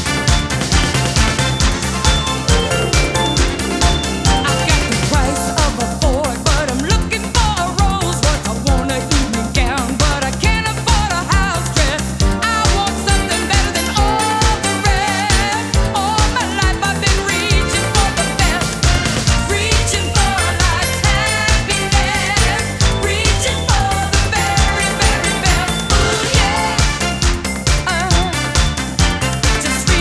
disco

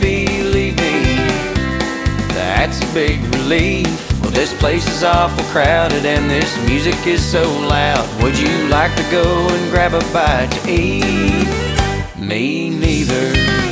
country

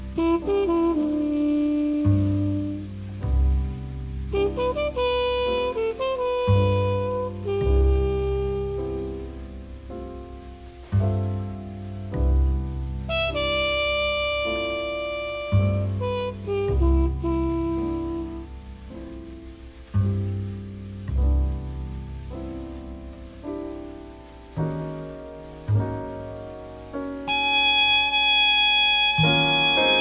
jazz

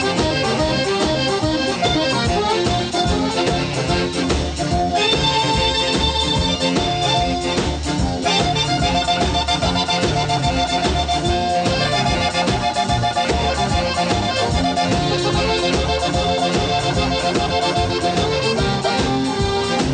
blues

